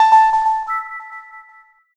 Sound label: Startup; nintendo dsi system music